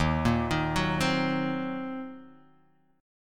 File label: Eb Minor 6th, chord; arpeggiated